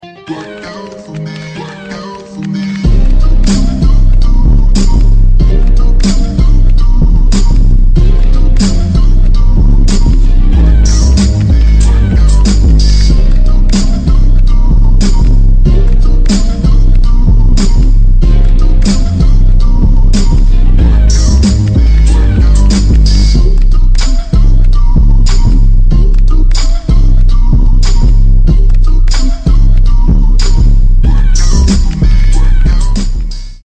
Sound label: remix slowed bass boosted